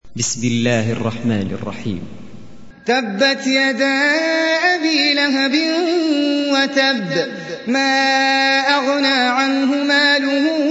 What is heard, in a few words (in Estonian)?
AlMasad retsitatsioon